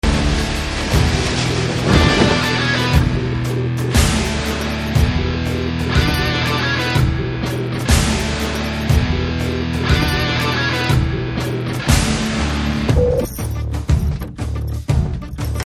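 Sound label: Lorry Start background music Ringtone; Kaithi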